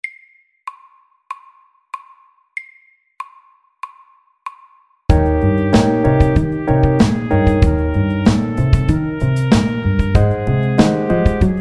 Kromatisk blues MIDDELS

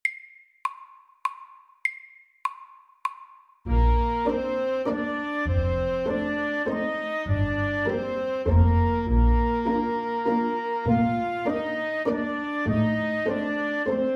Legato vals MIDDELS

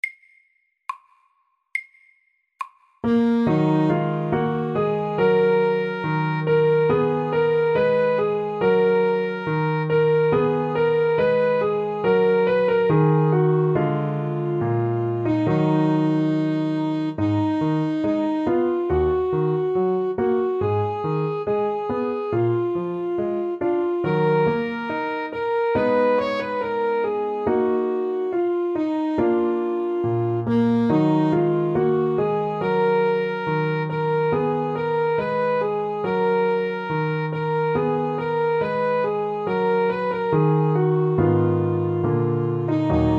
Gammel fransk melodi sakte

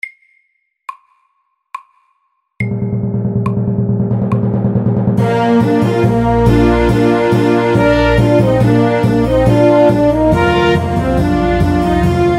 Air de trompette SAKTE

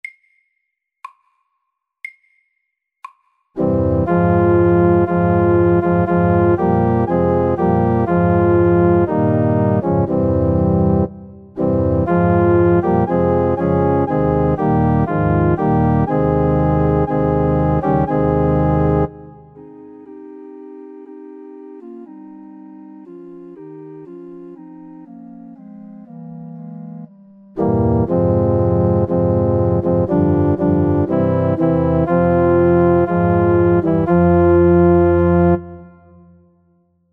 Marche funebre MIDDELS

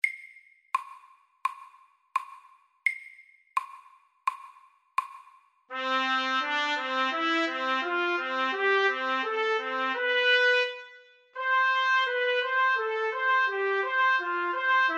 Intervall etyde SAKTE

